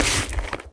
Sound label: fail; flappy witch2